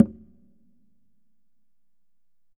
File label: CONGAS WET; Q Up Arts; Denny Jaeger Private Collection